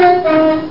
horn, train driver

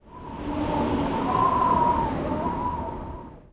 wind; urbanchaos

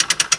blip; outlaws

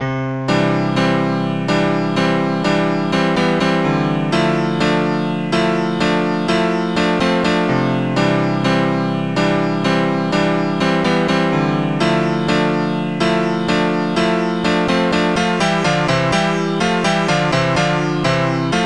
second piano; OctaMED SoundStudio V1; mug-members; tom cozzolino

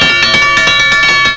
bell bell

